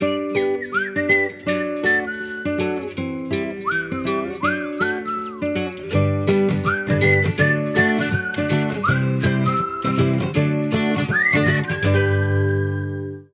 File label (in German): oster klingelton iphone